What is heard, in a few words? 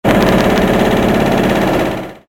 Riveting